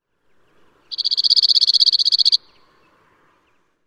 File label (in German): zaunammer